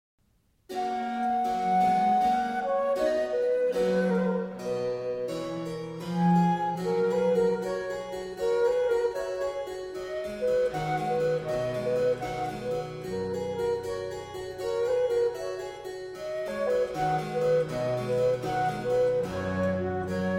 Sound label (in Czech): koncert a moll pro fletnu cembalo a continuo largo; teleman